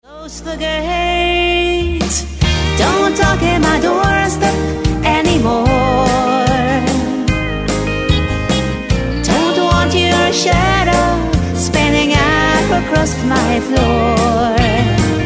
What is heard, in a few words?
country parlor